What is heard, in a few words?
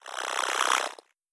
Jelly Charge; Legend of Keepers